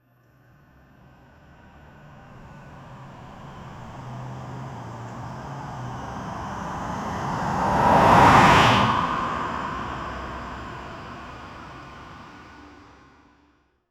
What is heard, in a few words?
an electric car approachi